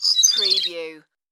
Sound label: Tui Flutter